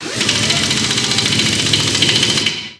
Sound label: Drill; blue archive